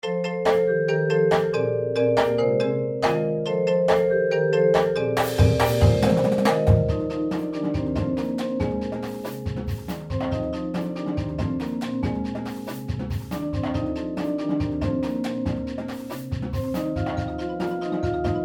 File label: PERC